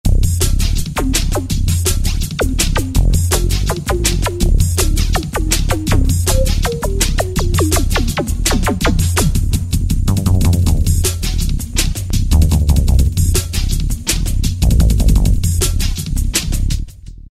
Alesis Z1 Hip Hop; Underworld

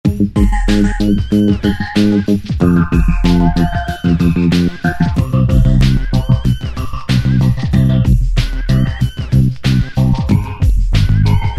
Techno 'Hood; Dance; Roland SR-JV80-06

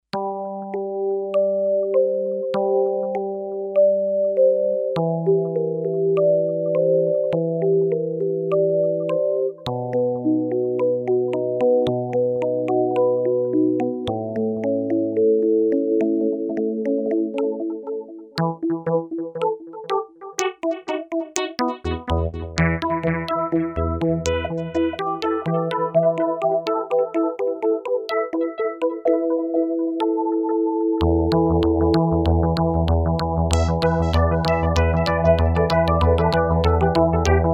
Kawai K5000S; Arpagee

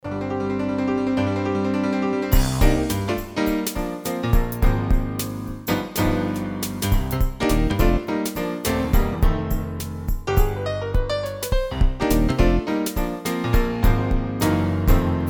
Piano Hop, Roland JV-880; Factory demo songs

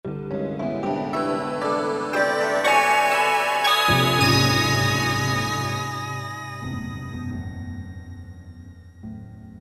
Bellocell; Roland PN-D50-02

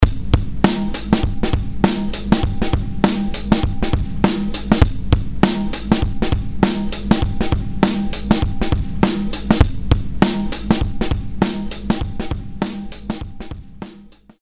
Live Edgy Drums; Apple GarageBand 2; Apple Loops

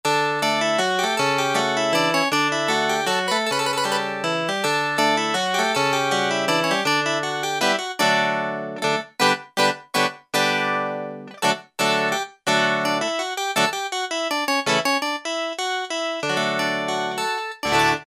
HARPSICHORD

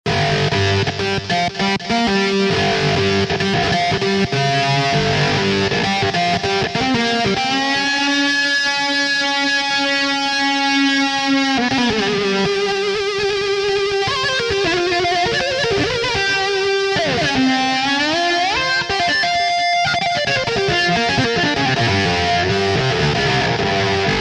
Freak Lead, Roland V-Synth; Factory Patches

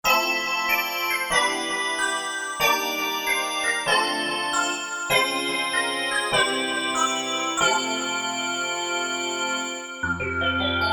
JX-10P, MIDI Combos, Korg DSS-1

